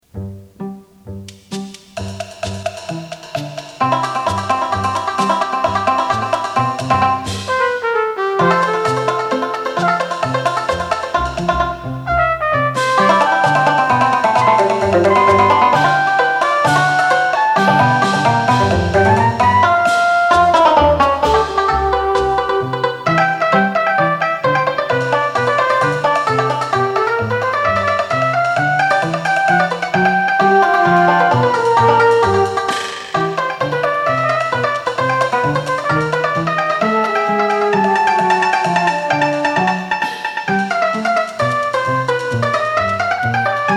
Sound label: Parathlassi banjo; music from theater